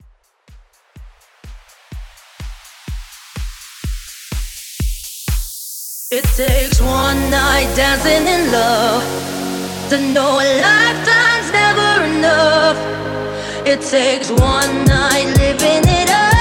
Extended Mix 125 Bpm

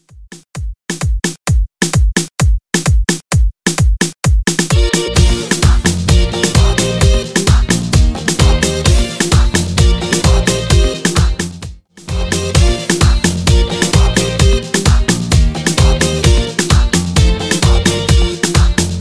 80s Pop Redrum

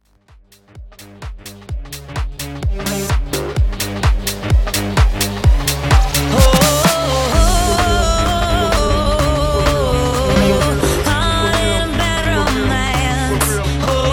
Basshouse Banger 126 Bpm

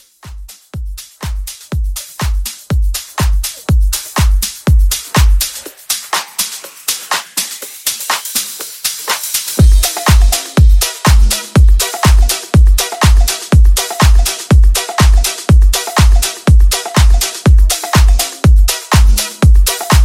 House 122; Mixshow Edit

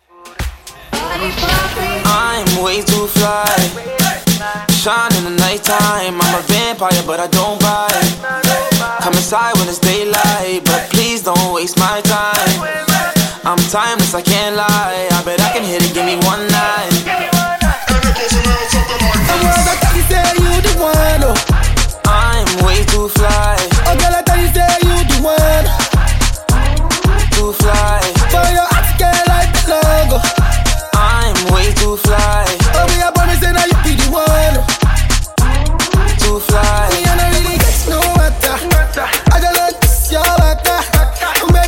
Moombahton; Redrum

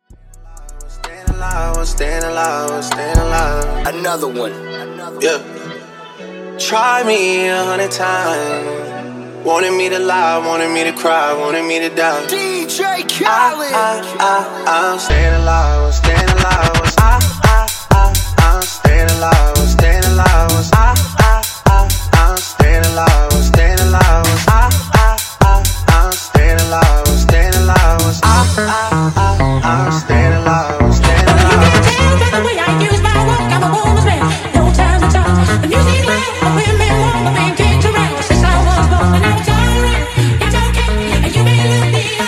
Wordplay Transition 64 128 Bpm

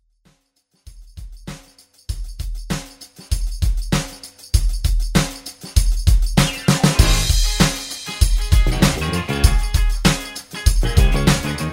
60s Pop redrum

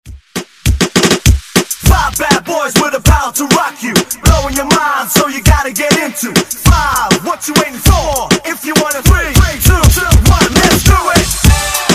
Reggaeton Redrum

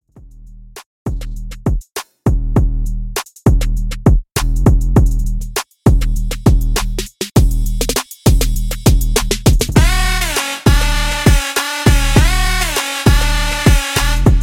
Trumpit Bass 100 Bpm

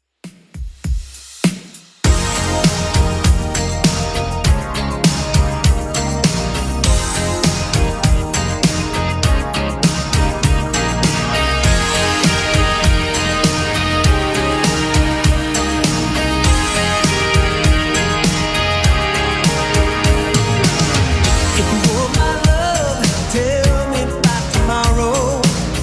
80s Club Edit 100bpm

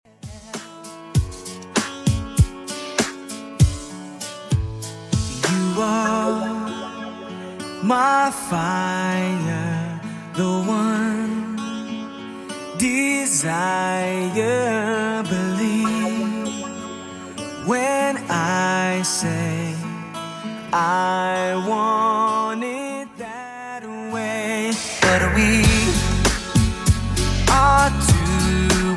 90s 98bpm; Redrum

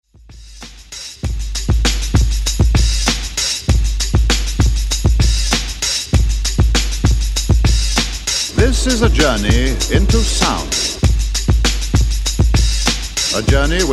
Top40 Redrum Rework 98 Bpm Clean

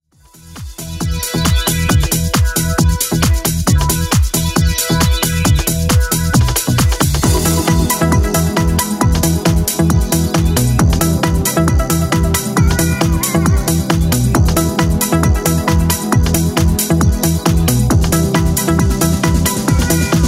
80s 12mix Redrum 135bpm

